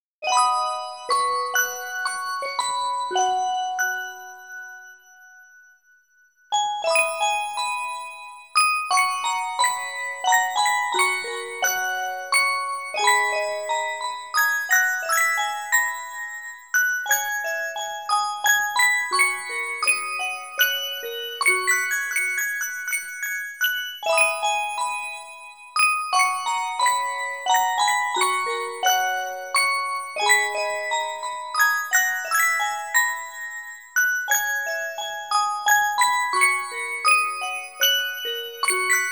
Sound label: The Madder, Far Away (Orgel); Atelier Viorate Original Soundtrack